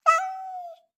Meows x 3; JASPER; Wearables

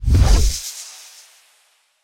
Broom On Pull; Basilisk Broom

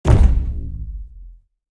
RC Ball Hit; Scraprun; Deathrun

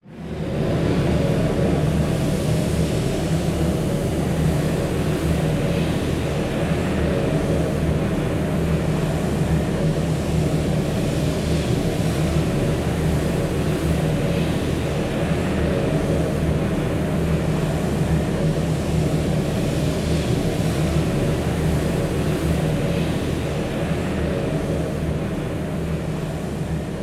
Launch Loop, Rocket BasiliskBroom

